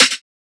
snare off; one shots; SEISMIC Drum Sample Pack; Slate

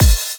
kick oneshot drift, Pop; Drums; Slate; SD PASADENA Pop Sample Pack

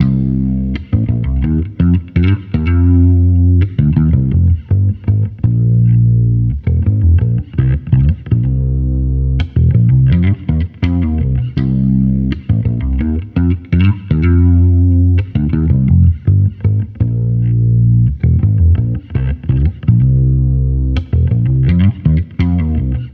Amped loop 83 C#m, Electric Bass Loops; Slate Digital BASSHOLE Sample Pack

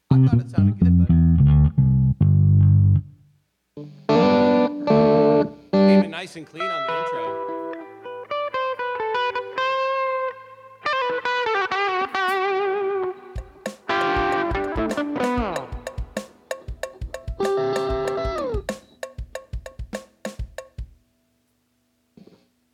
Strings

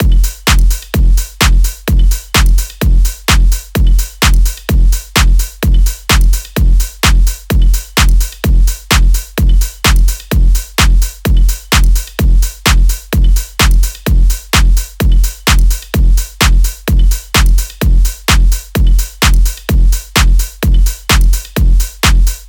drums 128, Full Drum Loops; drum sample pack; SEISMIC; Slate

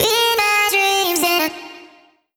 Vocal Sample Pack Phrases Eb; Slate Digital Omnivox Vocal Sample Pack

